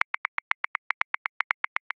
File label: Funk Uptempo 16ths; Grooves; Ableton Live 12 Lite; Core Library